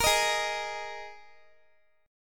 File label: Ab Suspended 2nd Guitar; strummed; Absus2; x,x,22,20,20,x